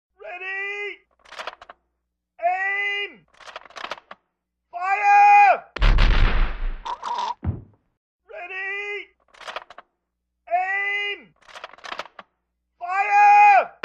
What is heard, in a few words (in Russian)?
Po komande vystrelivajut v ljudej